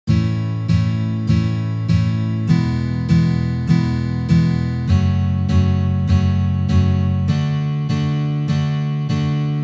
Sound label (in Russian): Uprazhneniya dlya igry akkorda Lya mazhor na gitare